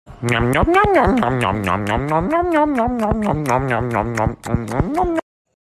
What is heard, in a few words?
yum yum